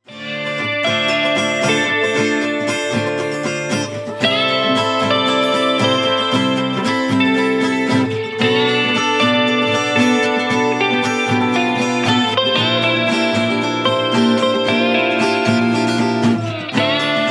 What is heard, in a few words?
guitar